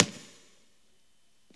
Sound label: VHS; drums